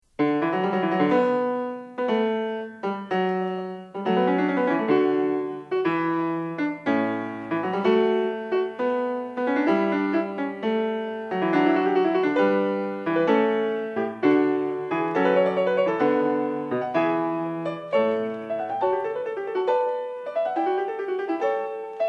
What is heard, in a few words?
Well tempered clavier book 1, No. 5 in D major, Fugue; Bach, Johann Sebastian; BWV 850